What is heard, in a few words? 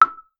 cute dating app notificat; app sounds